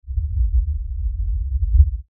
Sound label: LFE Reverse, Lfe Reverse Element Swell Low Bass; Blastwave